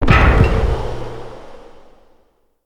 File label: Pull Beam Destroy; Encounters; Collector